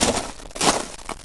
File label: Dendroid Soldier Move Sound